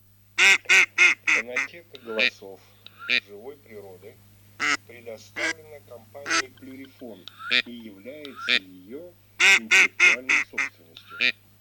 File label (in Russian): Серая утка Canapiglia; plurifon